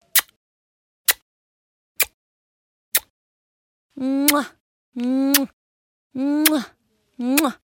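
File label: air kisses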